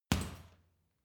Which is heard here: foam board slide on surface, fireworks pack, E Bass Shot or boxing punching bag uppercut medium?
boxing punching bag uppercut medium